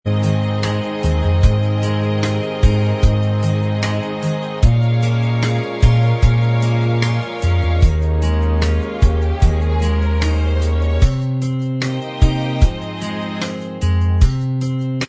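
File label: instrumental, backing tracks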